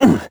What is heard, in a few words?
dig; Crypt of the NecroDancer